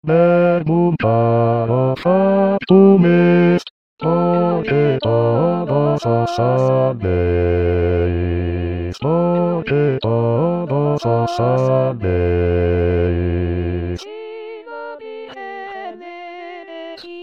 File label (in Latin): verbum caro bajos